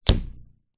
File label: foley tape stretched tight long pull release twang